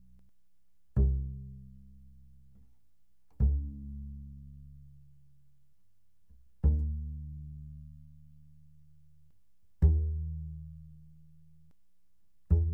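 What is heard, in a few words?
Bass pizzicato fortissimo sul E C2 B2, doublebass; Strings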